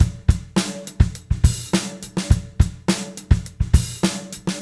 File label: Crunchy 104, Beat Loops; Zero-G; Monster Beats